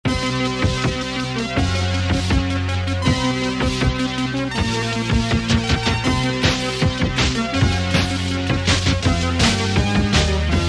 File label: Juno60